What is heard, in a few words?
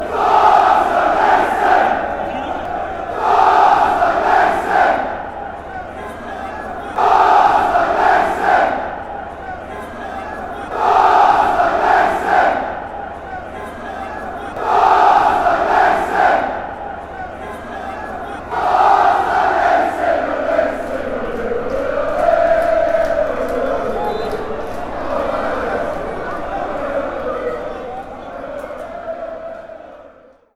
chant, fan chants; genk